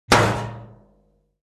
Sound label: Metal keg putting down on the ground